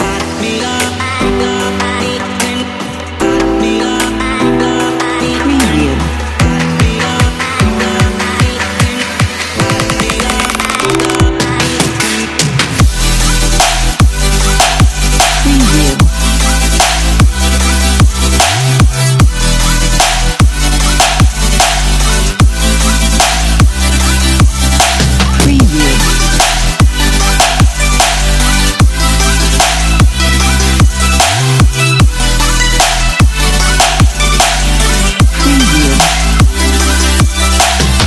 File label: future bass